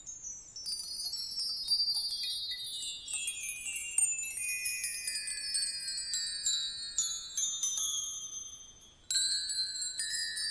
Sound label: bell tree; sonicville